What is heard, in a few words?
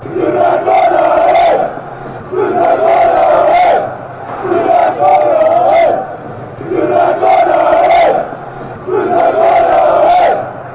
montenegro chant